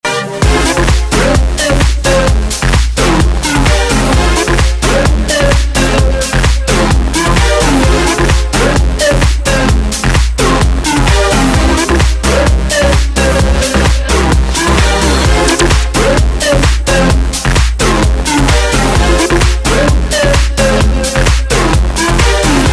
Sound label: Bass